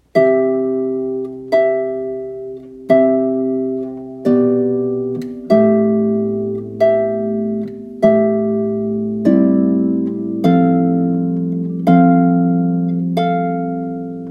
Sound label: harp